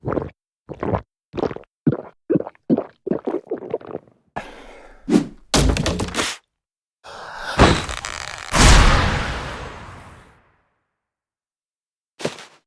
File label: transform; rimes